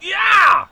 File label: ow